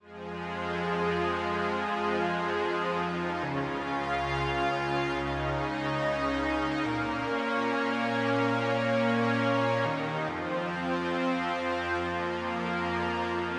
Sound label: Vintage Strings; krizziz